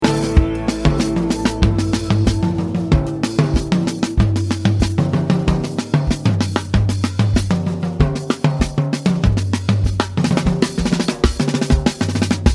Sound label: Percussion Scetch